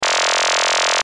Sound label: Sci Fi Scanner Beam Short; Scanner Beam Short Loop; Tech